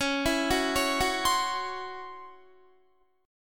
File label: Db Minor Major 7th Double Flat 5th Db down Guitar; arpeggiated; DbmM7bb5; JGuitar